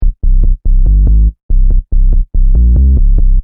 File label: Techno Trance Sub Bass Loop In C 142 Bpm